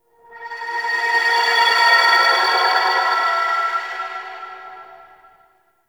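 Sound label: Drifting Texture; Warframe; Ambience; Entrati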